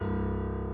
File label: key